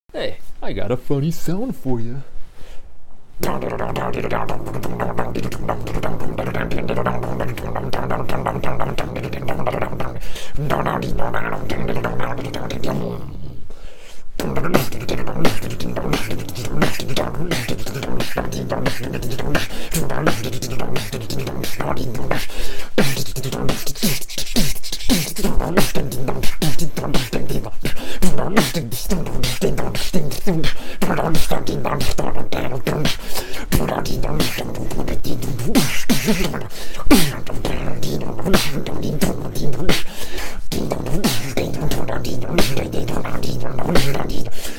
beatbox a funny sound

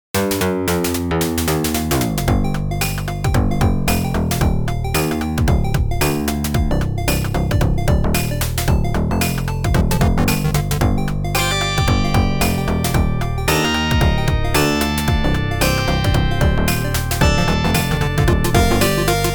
newer jack swing opl3; weeklybeats 2024

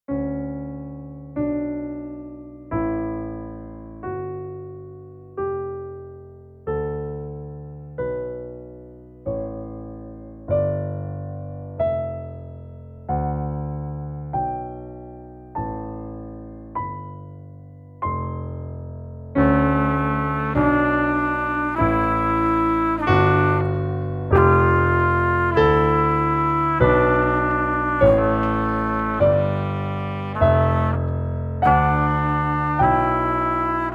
duet for piano and trumpet

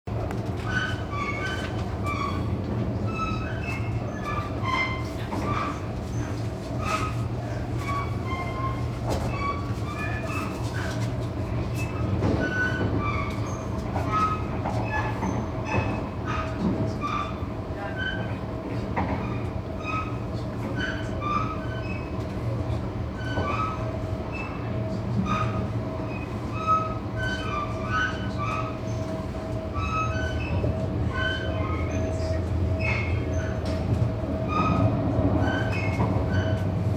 fieldrecording train melody; weeklybeats-2020

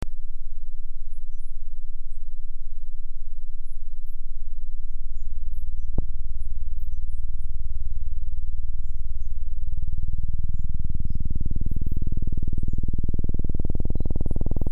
ambient noise glitch; paradigm; weeklybeats-2012

